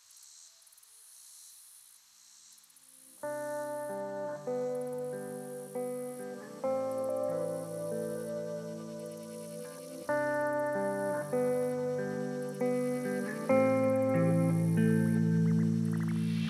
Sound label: lofi hip hop